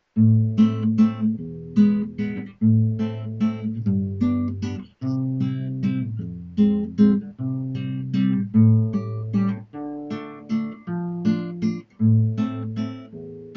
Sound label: guitar